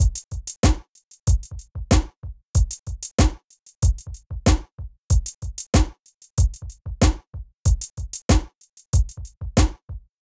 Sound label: dope 94bpm